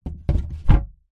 foley wooden box crate lid put on recorded from inside; zapsplat